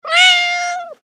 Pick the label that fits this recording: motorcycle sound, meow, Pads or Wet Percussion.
meow